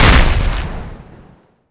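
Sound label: turret spark explosion, weapons; zmall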